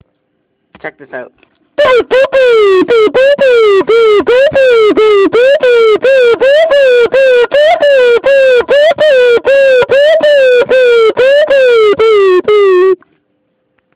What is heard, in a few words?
square waveform synthesizer test